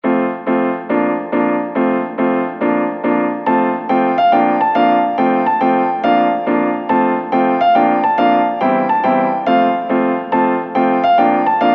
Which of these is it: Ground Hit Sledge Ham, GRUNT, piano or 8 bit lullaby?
piano